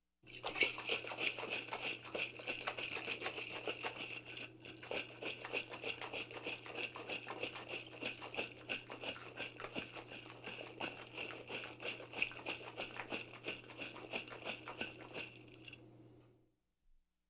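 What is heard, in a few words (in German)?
In Der Küche Flüssigkeit In Einer Flasche Schütteln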